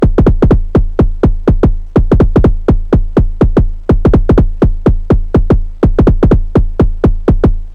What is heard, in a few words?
kick and perc analog techno 124 Em; drum loops; volcadrum